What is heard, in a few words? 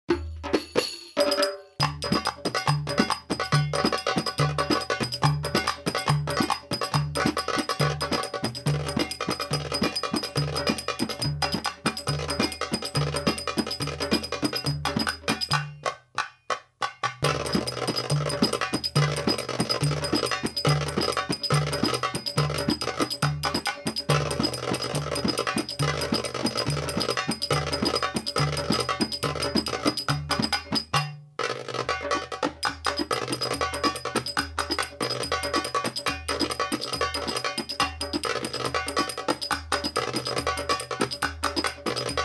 Darbuka Solo Belly Dance